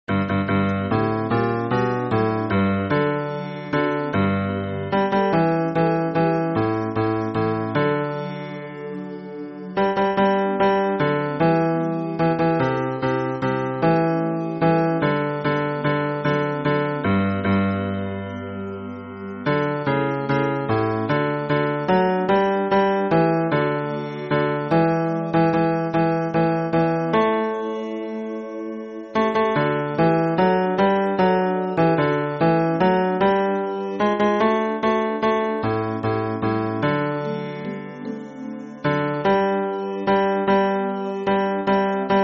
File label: hymn bass